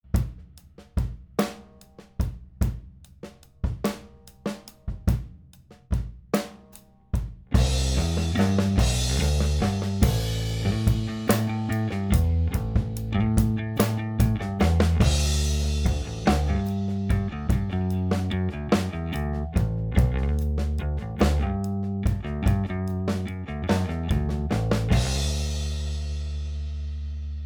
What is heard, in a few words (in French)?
mix sans effet